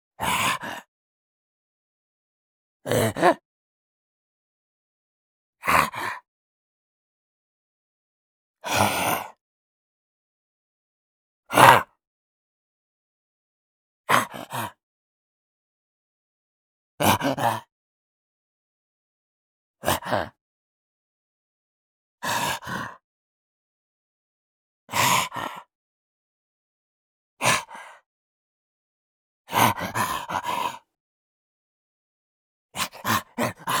ZOMBIE MALE EXCITED QUICK BREATHING VARIOUS LENGTHS